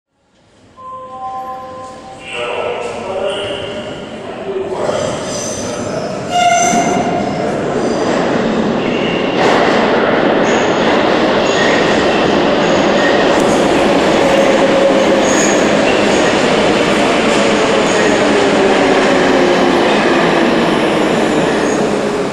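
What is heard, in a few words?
Kharkiv metro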